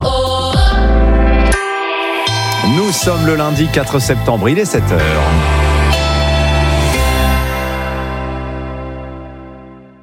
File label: CARILLON; EUROPE 1